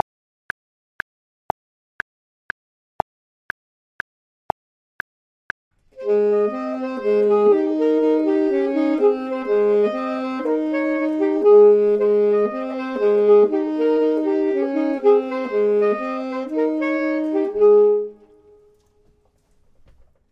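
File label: both, Saxophone and Clarinet; voxman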